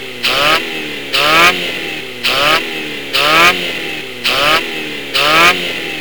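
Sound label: advanced revving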